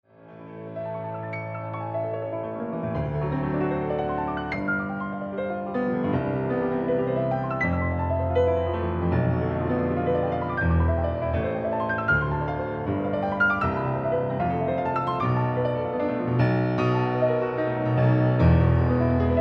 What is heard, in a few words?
Piano; Chopin Etuede C Dur